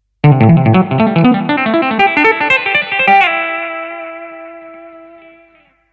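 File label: Lick, MODERN TAPPING; Guitar Club Maggio 2025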